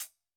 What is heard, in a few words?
Hihat; urban grooves; Rock